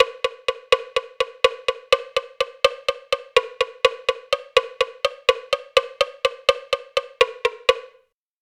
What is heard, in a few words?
woodblock bass